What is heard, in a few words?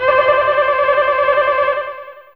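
Violin Tremolo C3, Fairlight CMI IIe; TremStr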